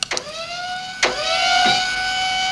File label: HYDRAULICS; Northstar; The Wizard VOL-1